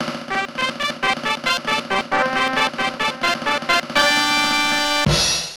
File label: Jingle Fan; Mr. Driller Drill Spirits; nintendo ds